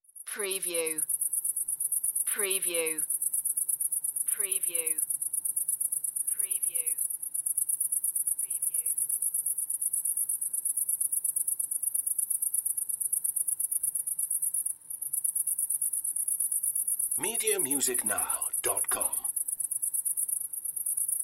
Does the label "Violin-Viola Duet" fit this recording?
No